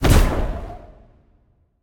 Cast Arcane; smite; merlin

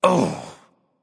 yay; smite; hades

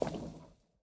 footstep; smite; hera

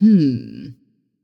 nv thinking; smite; voicepack; morgan le fay

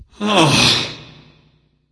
nv sigh; smite; voicepack; xbalanque

